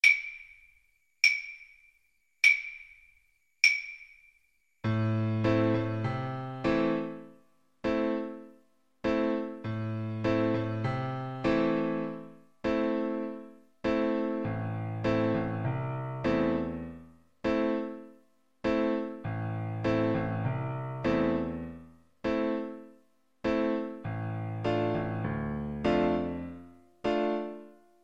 reggae, piano

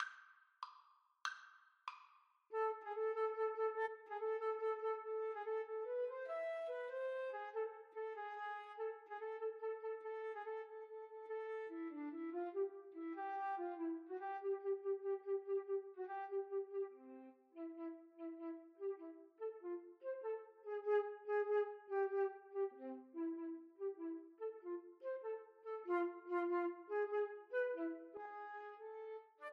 Flute duet

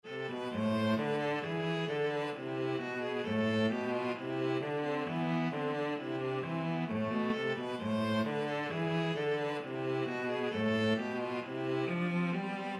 bourree, viola cello duet; bach

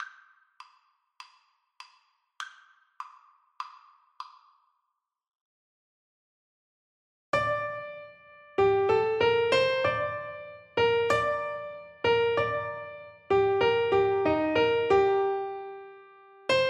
piano duet

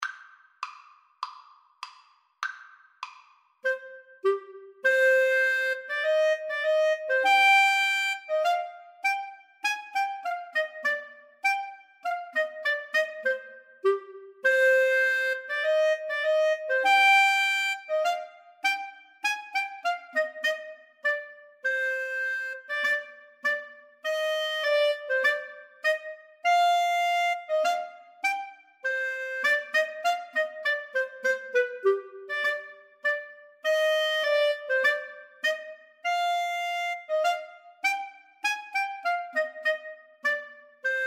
Flute Clarinet duet; L'Arlesienne Prelude

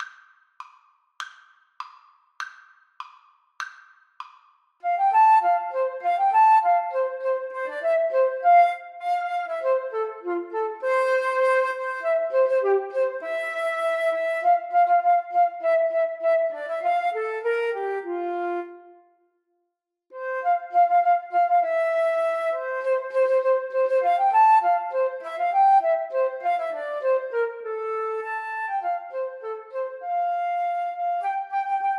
Flute duet

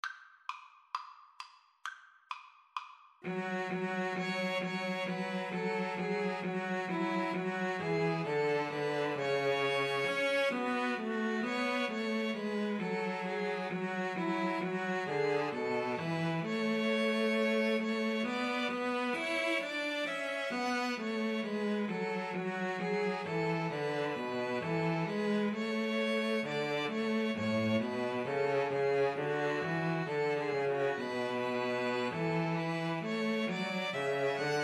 god rest, 2 Violins Cello

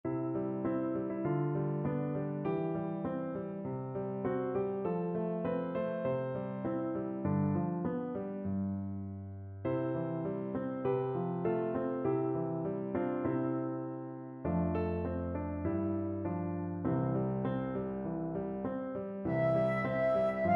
flute

